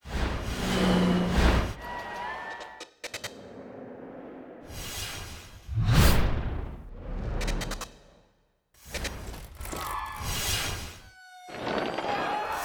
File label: SFX; NewsOneNow